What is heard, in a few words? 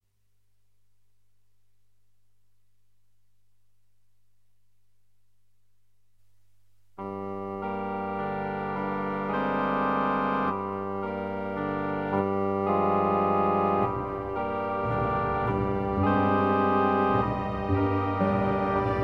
cemetery jazz